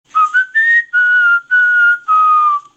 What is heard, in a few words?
Wiggle whistle